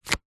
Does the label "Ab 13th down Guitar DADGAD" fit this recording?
No